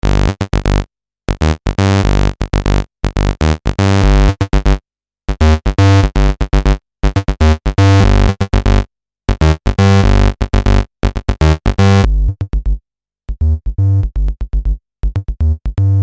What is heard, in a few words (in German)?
Nanozwerg Wellenformen Riff